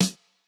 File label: snare; Reference Beat BPM120; j city pop 1980